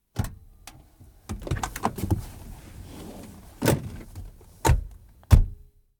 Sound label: Car Doors Open and Close Multi; The 39 Steps